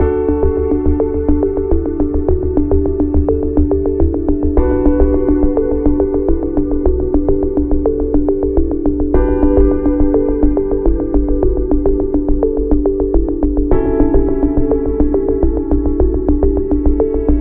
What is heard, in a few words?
Medical Health Promo